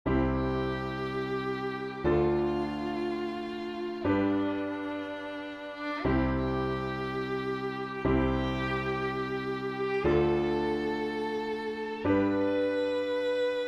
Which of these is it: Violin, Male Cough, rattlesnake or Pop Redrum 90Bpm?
Violin